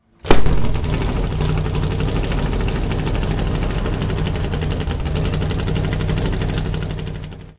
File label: 1969 Lola T163 start